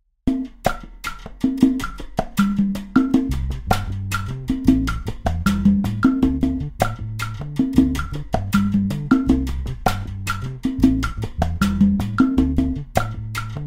Guaracha

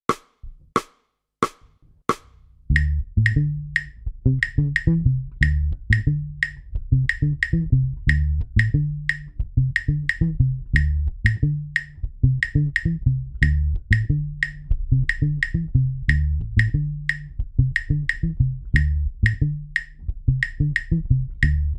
tumbao 3-2 off clave

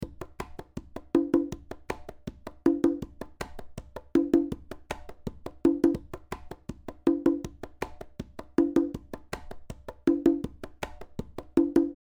Marcha

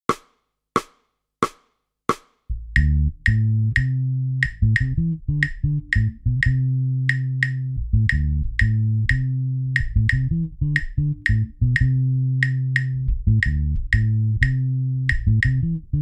tumbao 3-2 on clave

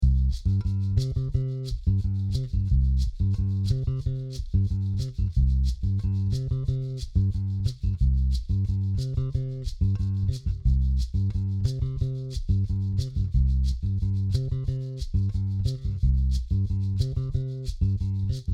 Habanera

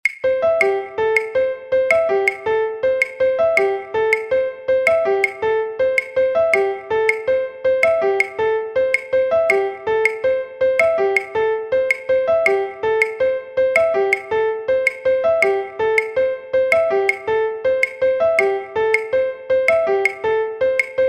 non clave tumbao

